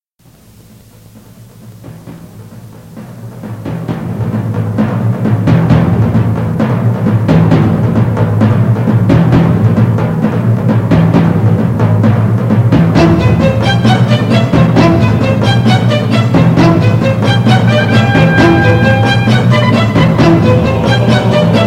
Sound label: fanfare